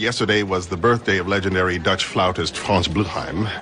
flautist

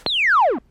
laser; Bob's: Laser!

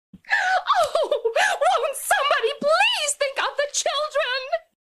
children

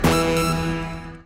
burst; RL Burst

